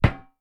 sling metal, attack impact

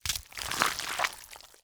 gather meat

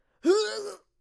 male death

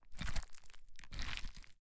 gather meat

